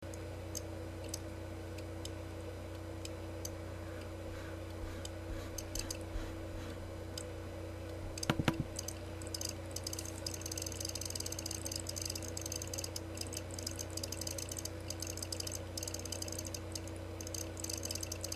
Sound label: dell mini hard drive